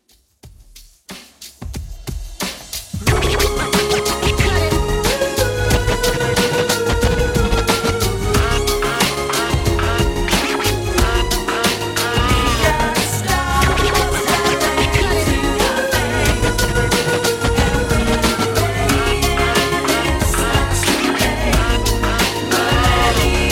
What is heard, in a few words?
91 Bpm; Dj Intro Outro